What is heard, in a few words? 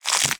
eat